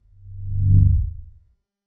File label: ui hover swish